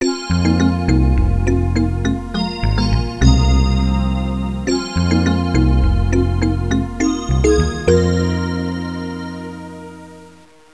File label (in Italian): suonerie contemporanea; musica contemporary